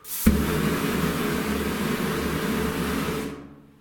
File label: flamethrower